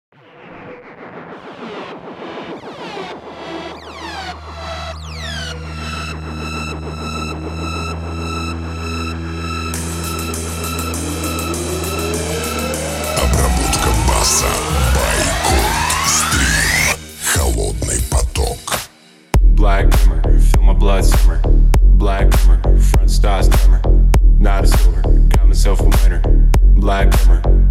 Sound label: Bass